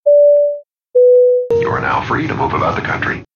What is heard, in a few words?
Trance Ringtone